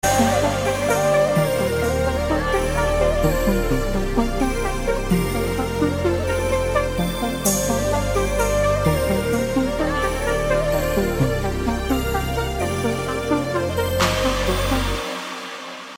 BG Tone

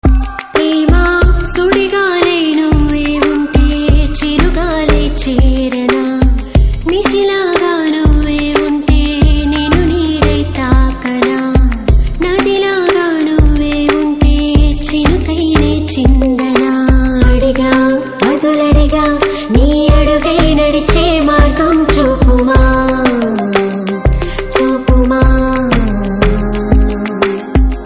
Female Version Ringtone

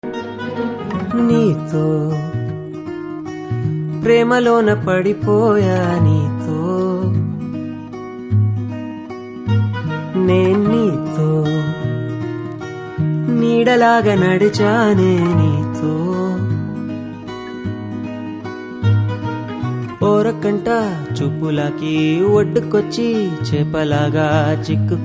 Telugu Movie Ringtone; Jagame Thandhiram

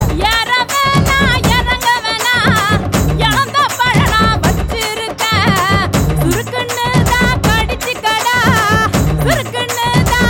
Female Ringtone

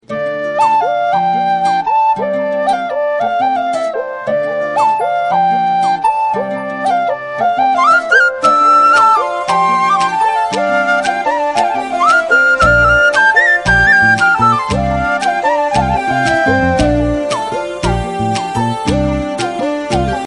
Flute Ringtone

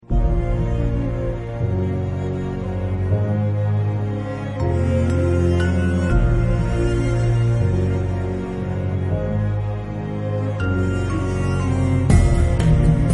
Karaoke Ringtone

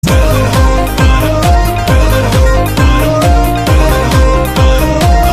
Love Bgm

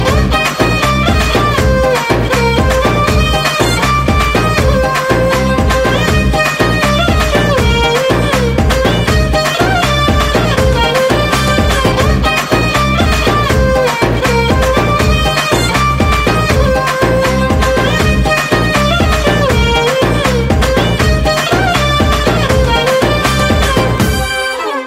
Instrumental Ringtone

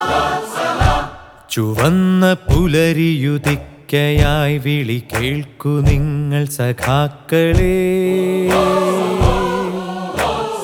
Ringtone

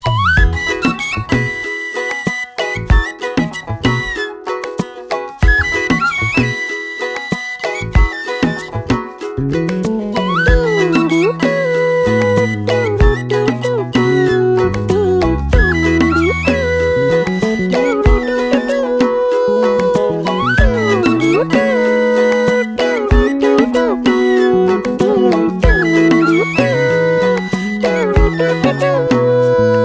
Flute Tone; Njandukalude Nattil Oridavela

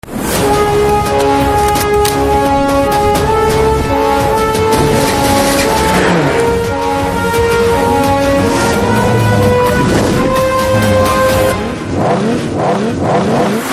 BG Tone; Race 3

